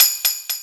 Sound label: TAMBRIN, TAMBOURIN; Big Beat Samples & More; AKAI